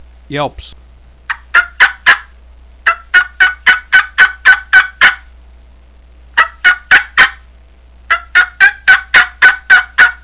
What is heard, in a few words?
true double yelps; primos